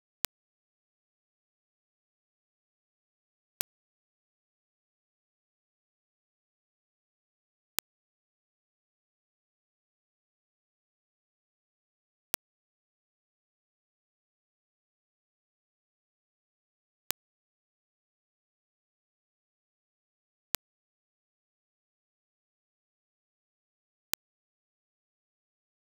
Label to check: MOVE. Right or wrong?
Wrong